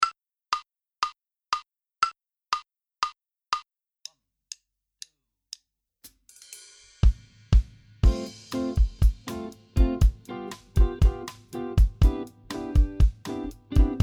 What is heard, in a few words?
Minus Bass; Inst Ensemble